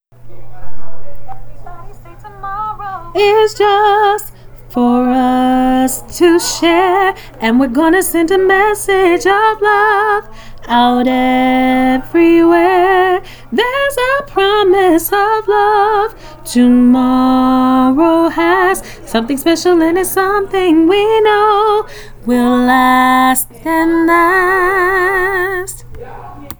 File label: (Alto)